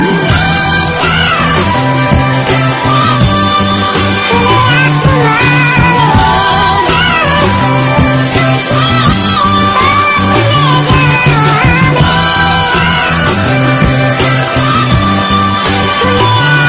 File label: Chipmunk Style